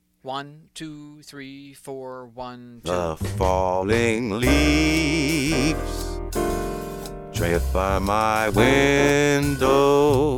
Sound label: singing synth